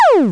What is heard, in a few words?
shoot